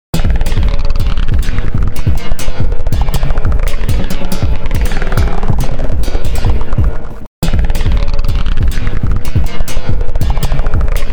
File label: ahhhhhhhh